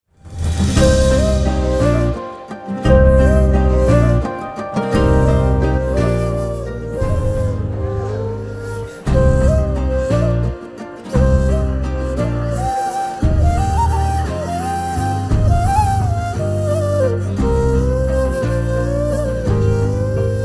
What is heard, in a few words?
First Sight BGM; Amaran